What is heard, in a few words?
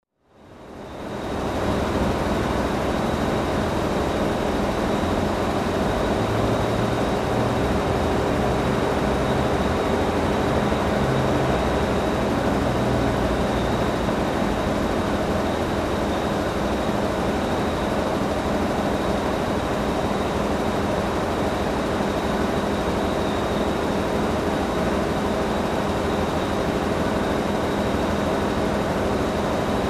Machine Engine Heavy Noise